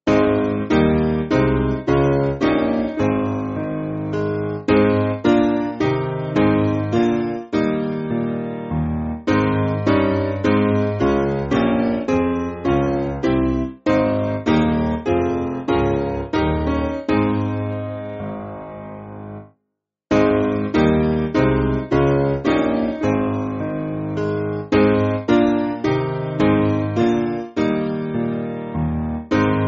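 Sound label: Piano, Music Backing Files for Churches; The Lord Is Risen; Narenza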